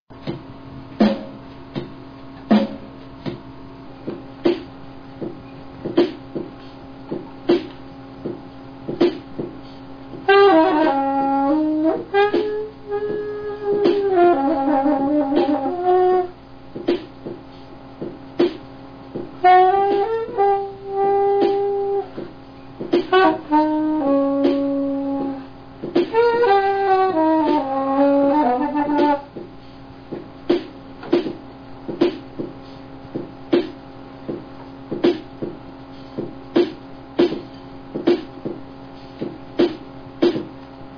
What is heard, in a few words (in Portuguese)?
batida sopro